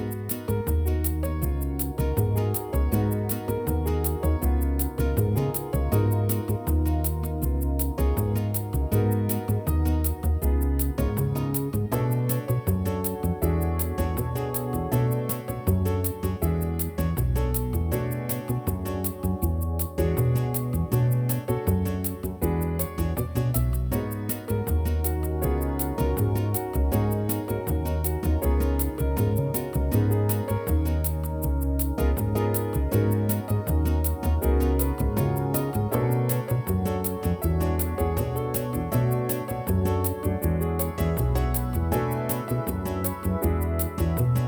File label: G#-B Dorian